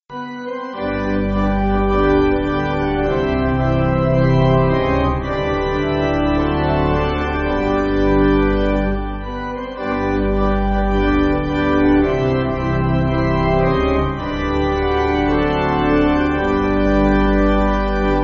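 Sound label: Pipe Organ; 哈利路亞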